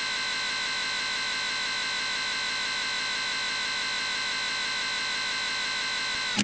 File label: zoom out; sgh-d730; Digital